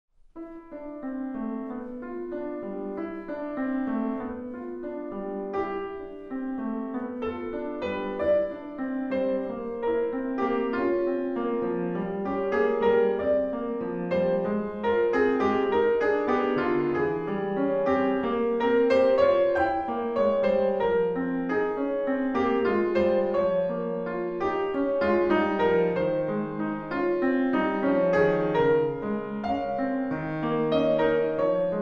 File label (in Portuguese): Piano Miniatura; Encontros com a Musica de Sao Paulo